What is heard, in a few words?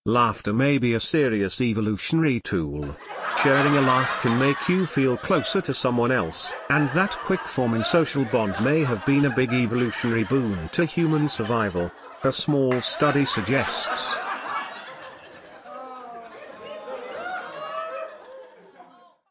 laughter laughtrack; laughter evolutionary tool